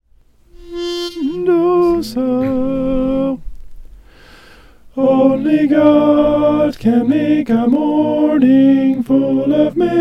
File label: only god can make alto